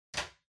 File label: Lighter Far Put